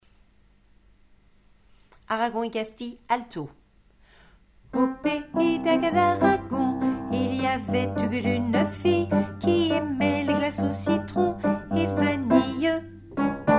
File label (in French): alto